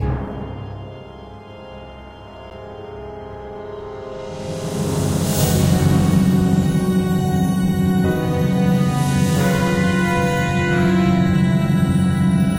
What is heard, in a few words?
Eerie